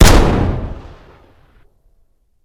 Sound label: fire dist int pistol heavy; gmod; arccw uc commons attachments